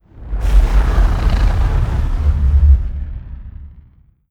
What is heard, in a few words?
sfx colossus moving out; Stellaris; nemesis